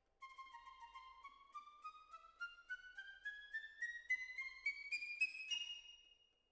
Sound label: Decca, Jazz for piano